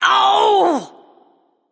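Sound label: ouch, female; smite; voicepack; hera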